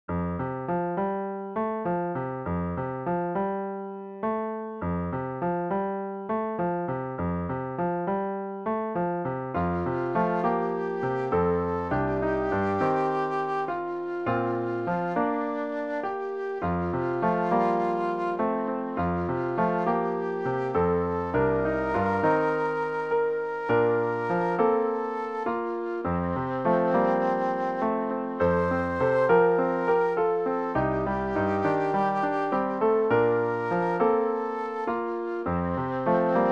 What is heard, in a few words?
piano